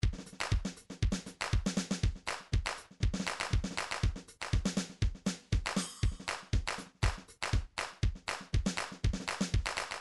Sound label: drums percussion, midi; midi vol 4 drums percussion